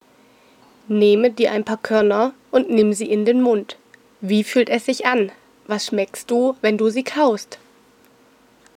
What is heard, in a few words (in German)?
Kauen